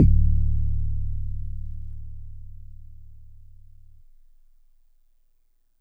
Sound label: Marimba DX100 D1